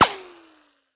Bullet Wizz, ricochet; tacz-tweak-pack; taczmechanics

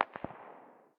ar very far distance, 5.56x45mm; tacz-tweak-pack; taczmechanics

